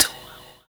Fx Vox; Equipped Music; Loungin House

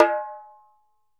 ASHIKO, African Drum; Drums&Percussion; E-MU Producer Series Vol. 7 – Old World Instruments

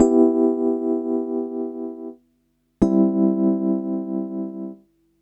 Rhodes

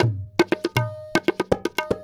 India, Heart of Asia

